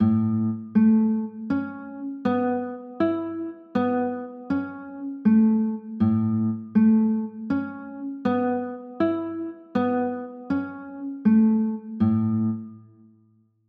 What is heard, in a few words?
a add9, chords